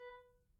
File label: Grand Piano Main Release B3, Kawai; Chordophones; Releases; Legacy